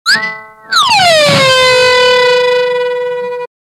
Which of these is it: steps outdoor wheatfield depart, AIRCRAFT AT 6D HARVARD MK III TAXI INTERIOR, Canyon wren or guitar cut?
guitar cut